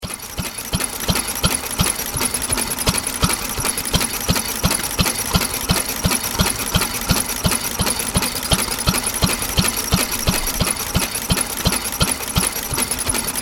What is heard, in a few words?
Old Motor, Detailed sound of old engine motor running.; Vintage Stationary Motor; Motors; Machines